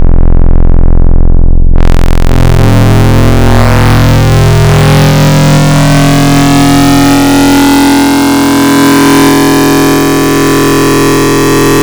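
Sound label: Soft Square Saw Mod; Synthetic's Serum Collection; Cymatics - Nuclear for Xfer Serum Wavetables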